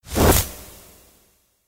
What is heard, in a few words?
Fire